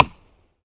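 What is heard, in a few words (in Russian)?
ритмичный стук дятла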